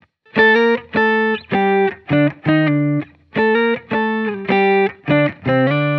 Electric Melody Loop, 160 BPM G Min; Cymatics

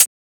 Closed Hat

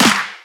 Shifted Clap; FLPRO

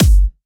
Kick, Kicks; FLPRO

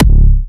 Harder C#4, Big Room Kicks

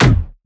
Perc, Percussion; FLPRO

